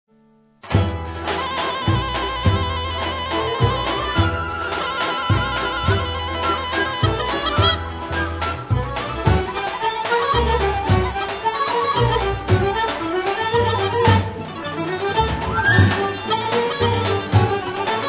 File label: Ethno Balkan Melodies